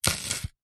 pepper single chop with knife on wooden chopping board; zapsplat